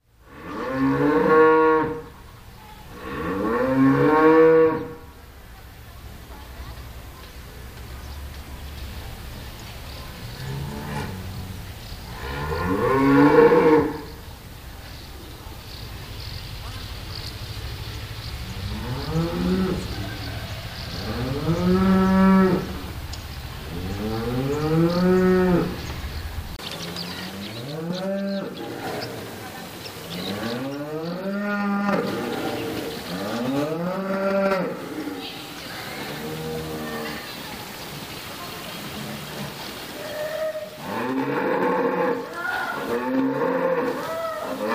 krowy

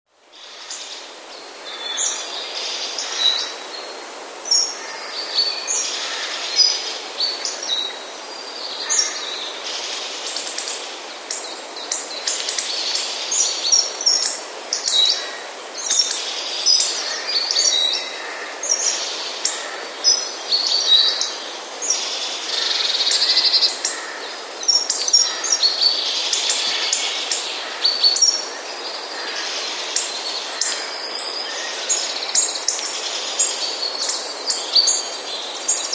grubodzioby

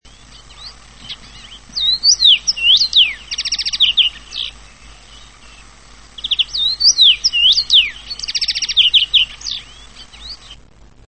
jeszcze inny śpiew dziwoni

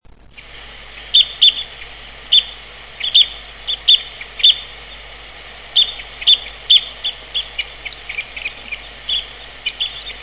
krzyżodziób sosnowy

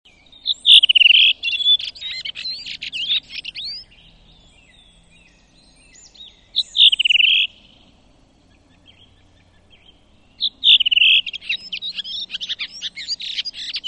droździk - śpiew; wroblowe; turdidae; turdus

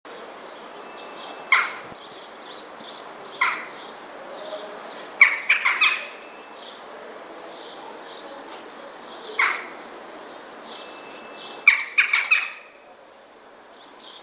kawka; Kawka zwyczajna; kawka jest średnim ptakiem synantropijnym z rodziny krukowatych (Corvidae

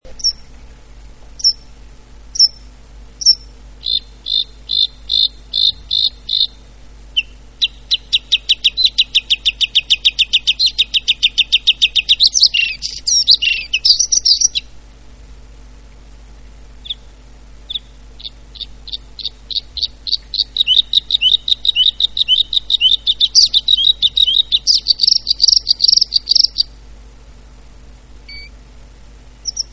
podróżniczek; wroblowe; turdidae

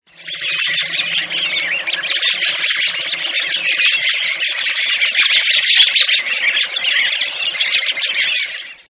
drozdy wędrowne; wroblowe; turdidae; Turdus migratorius

